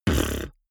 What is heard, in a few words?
beatbox bass drum wobble damped